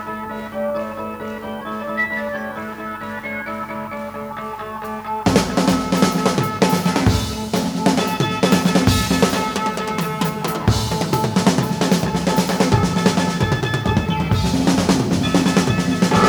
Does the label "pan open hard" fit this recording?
No